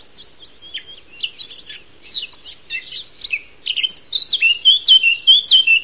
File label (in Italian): Capinera Sylvia atricapilla